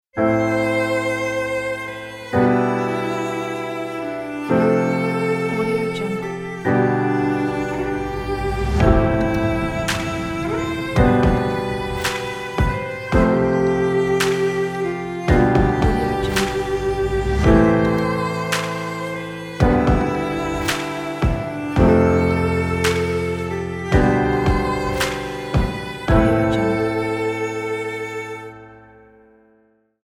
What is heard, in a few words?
emotional cello ident